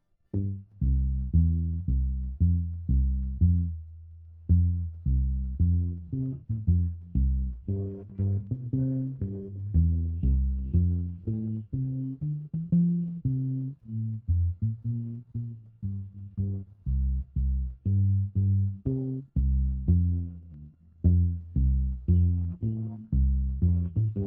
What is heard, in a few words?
bass